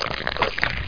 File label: squish; doom